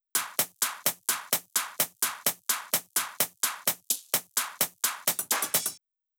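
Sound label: Drum Loop hi-hat add, 128BPM; VEC5 Drumloops; VEC5 Multi Loops 128BPM; Loop Elements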